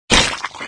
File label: Death